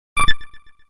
Digital button

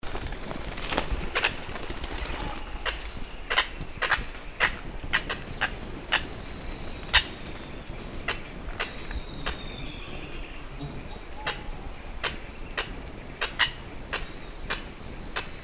Red necked aracari

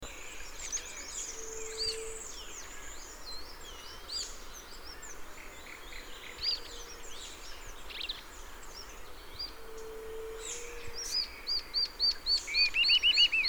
Rough legged tyrannulet

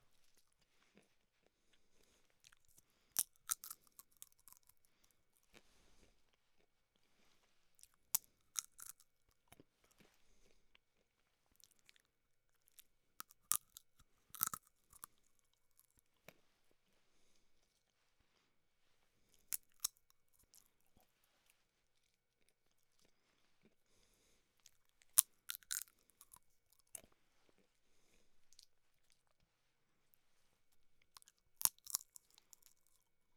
Eating seeds

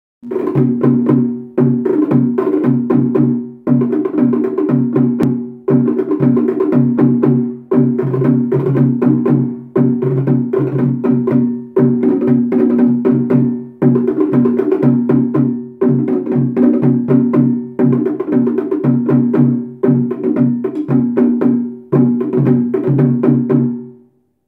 deserkoten

